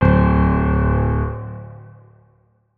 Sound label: notes, keyboard piano; Hideout Furniture